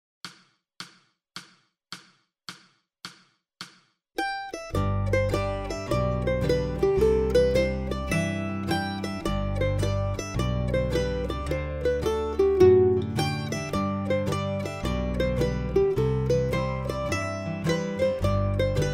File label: Mandolin Harmony Slow